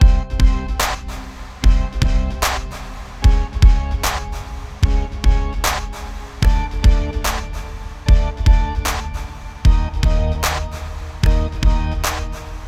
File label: Ditty